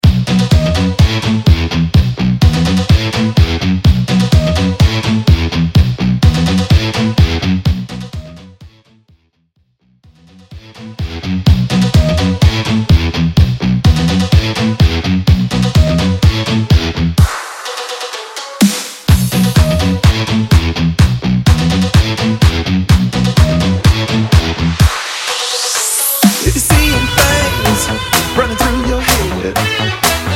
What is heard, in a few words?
EDM Halloween Short Mix; Mainstream VIP